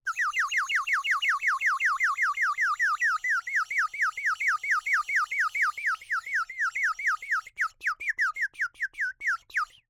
Birdwhistle, clay, painted, water, airy, wispy, constant, many chirps, dies off, chokes, water plops; Eiravaein Works; Vaeyan IV